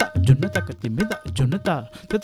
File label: India, Heart of Asia